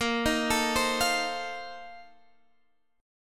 A sharp Major 7th Suspended 2nd Suspended 4th A sharp Guitar Half Step Down; A#M7sus2sus4